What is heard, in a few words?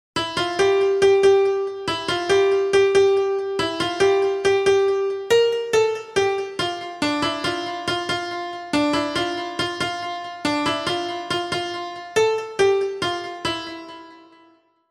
piano